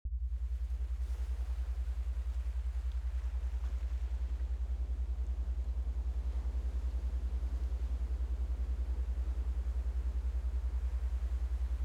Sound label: Binaural Alpha Beats Meditation; BoaWaves; Lucid Dreams; binaural beats boa series boasky